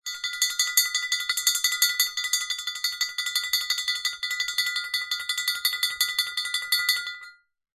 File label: metal tube metal object clang ring fast long; foley